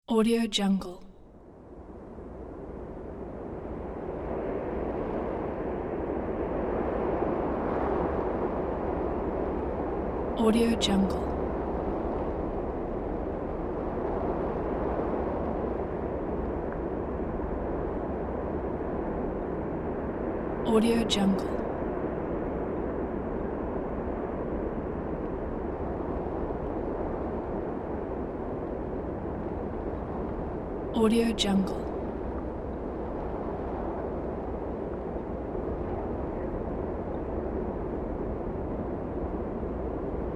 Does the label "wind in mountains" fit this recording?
Yes